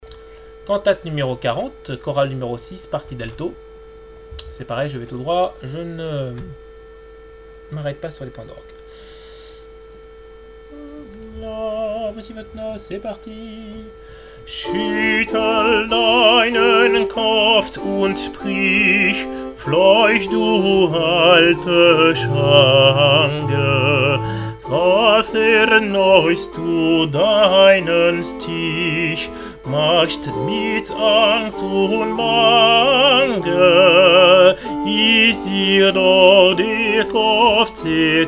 coro alt; bwv 40

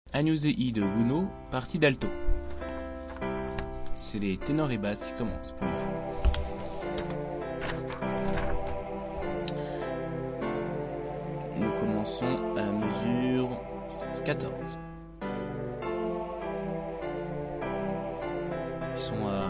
Agnus altos; gounod

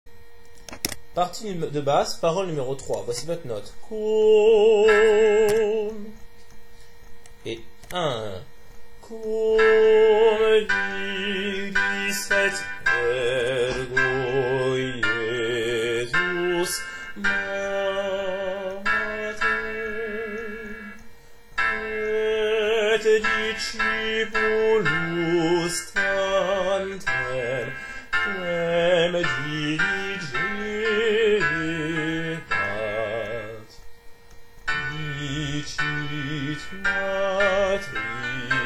bass

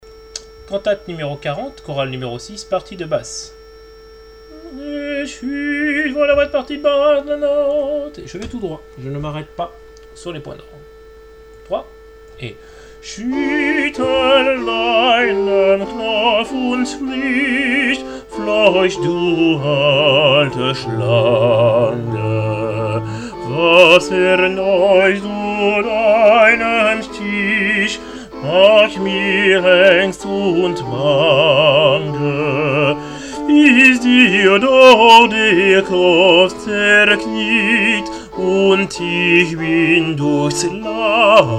Basses; bwv 40 coro6

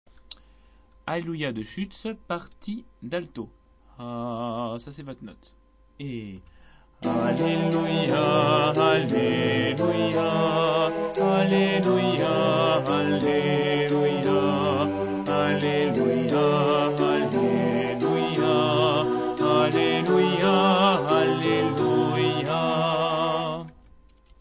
Alleluia altos; schutz

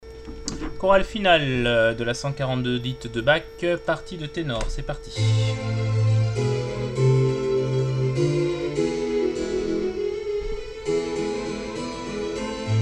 alleluia ten; bwv 142 8

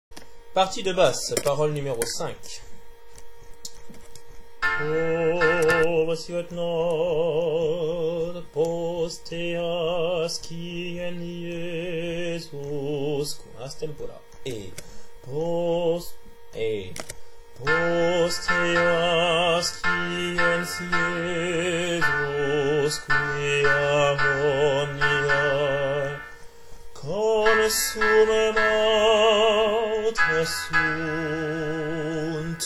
bass

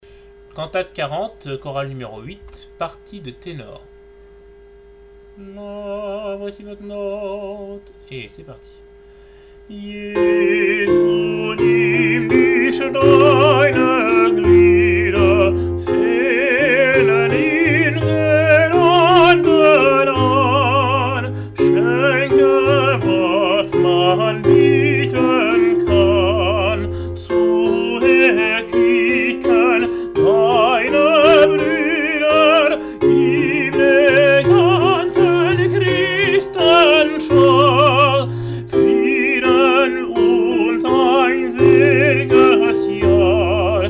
coro ten; bwv 40

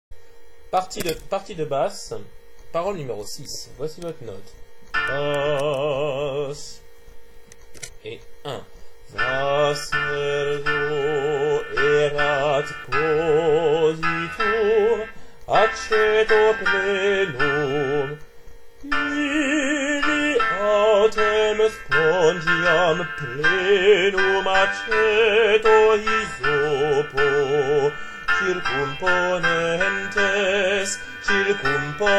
bass

